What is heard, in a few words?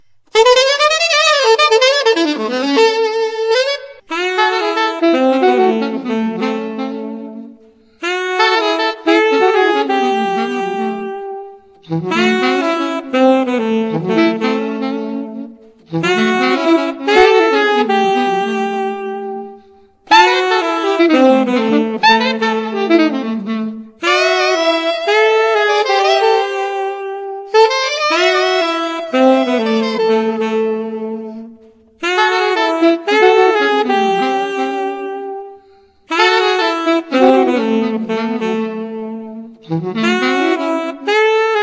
sax